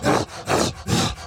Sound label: Enraged Breathing Loop; The Town; Burns